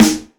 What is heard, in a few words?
rich lows snare drum D# key; snare drum samples